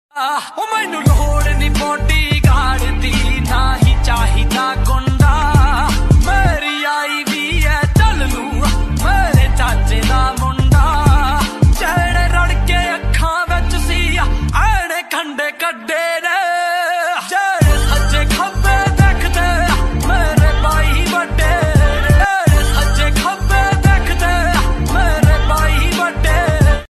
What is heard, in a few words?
Trending Punjabi Ringtone